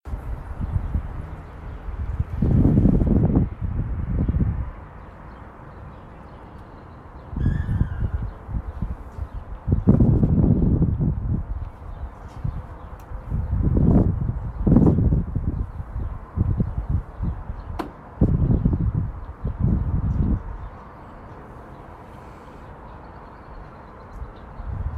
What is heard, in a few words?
Chicago IL